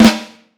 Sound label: FLAM, Vintage Snare Drum; Q Up Arts; Johnny.C's Vintage Snare Drum; 6.5X14